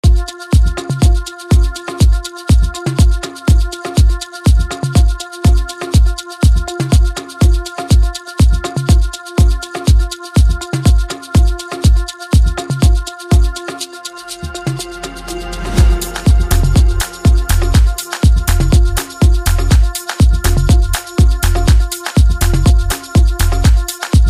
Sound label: Afro House Remix